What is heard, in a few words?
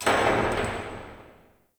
Distant Metal Clang Ring; Ambience; Dungeons; Under City